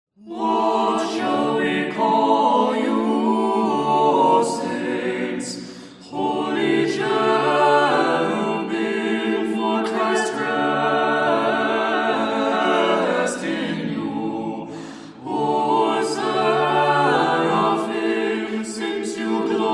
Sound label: Optina Hermitage Chant, Tone 8; What Shall We Call You